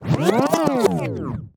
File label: Rewind